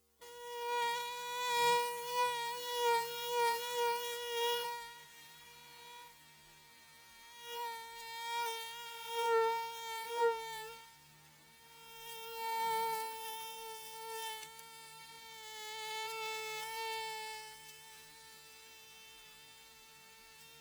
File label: mosquito